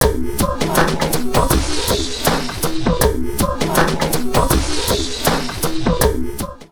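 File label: drum loops crazy processed, 80 bpm; USB Soundscan vol.07; AKAI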